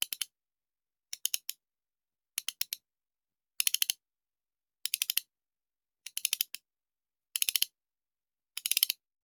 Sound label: Hand Gun Foley; Sound Ideas; Guns